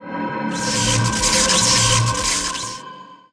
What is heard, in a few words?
Portal; MUDevs S20.1-3